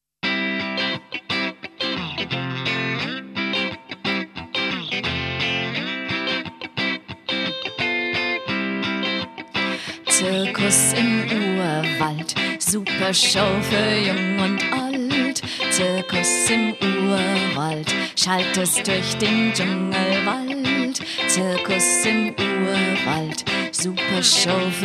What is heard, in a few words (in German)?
zirkus